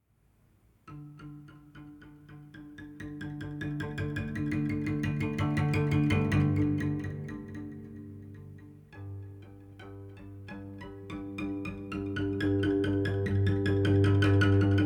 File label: Savannah Music; kenshi windows gamerip 2018